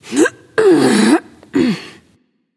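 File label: grunt lift, female; smite; voicepack; hera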